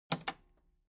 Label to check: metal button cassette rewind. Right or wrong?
Right